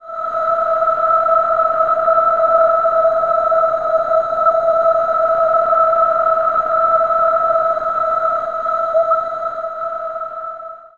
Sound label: Helium Flute E; Zero-G Seismic Frequencies; 90 s Sample CDs